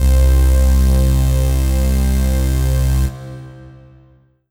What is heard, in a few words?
Korg TR Rack Saw Stack C2